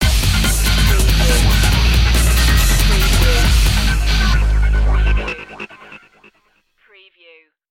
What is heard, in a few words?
un-fairground stinger